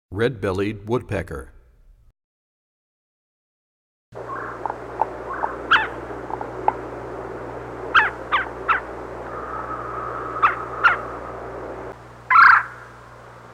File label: Red Bellied Woodpecker, Bird Songs; Animals